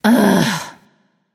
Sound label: "ugh"; smite; voicepack; nike